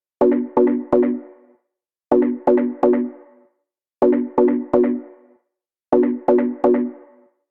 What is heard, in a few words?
126 Bpm Synth Pad Stab